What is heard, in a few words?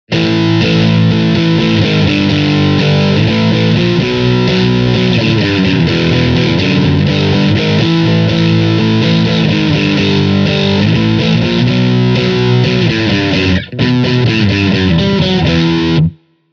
Blackstar ID Core 40 V2 overdriven